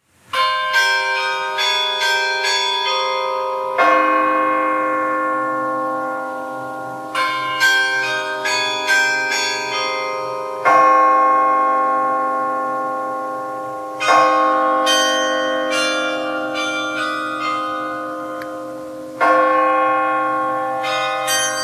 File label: carillon; Shadow and Light; Range: 4 Octaves; Composition Year: 2015; Publisher: GCNA